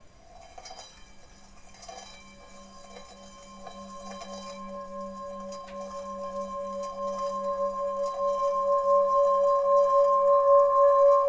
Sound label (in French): Bol bengale sol